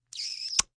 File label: Kiss Short, Kissing, short, powerful smooch (human voice); VoiceBox